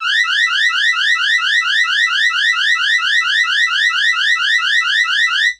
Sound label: cockpit alert